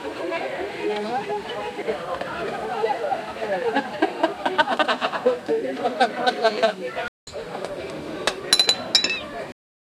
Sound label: people enjoying at a chil